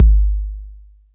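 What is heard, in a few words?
bass drum 808 Sat Click Decay C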